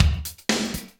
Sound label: Loop, Roland; Sound & Vision - Gigapack I